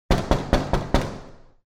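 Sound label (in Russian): дверь со стеклянными вставками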